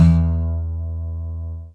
lyra; theodyssey

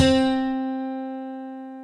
lyra; theodyssey

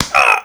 human pain; theodyssey

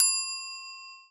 ring idea

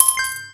game get point; theodyssey

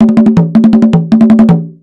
drums timbali; theodyssey

